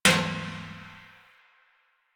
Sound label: Metal Trash Can Impact